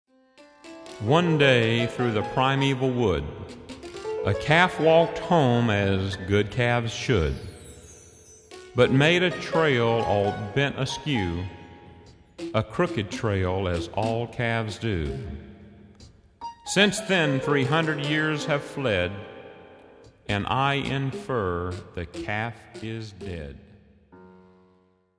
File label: Prepared Piano